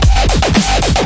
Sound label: dubstep 2 step, drum loops and breaks, 112 bpm